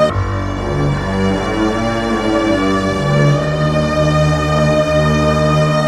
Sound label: strings; Sad